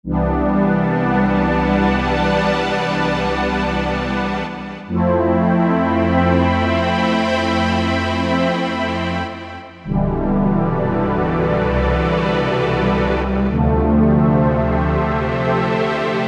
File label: Stellar Pad